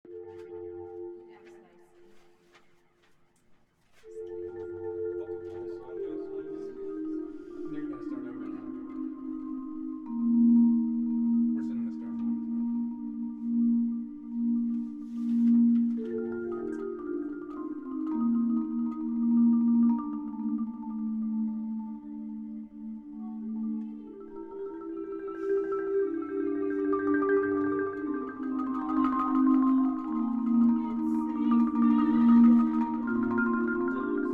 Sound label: marimba